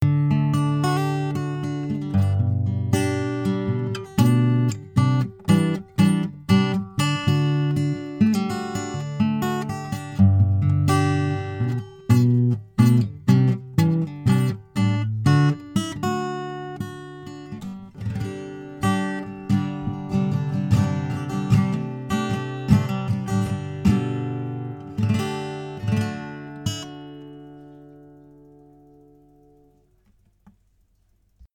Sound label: guitar test; blue-raspberry